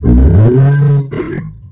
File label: machine alert; Maschinenalarm